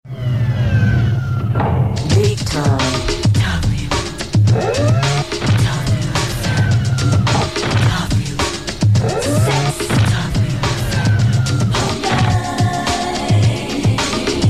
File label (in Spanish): Percusiones